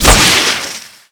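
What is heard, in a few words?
water pipe burst; Explosions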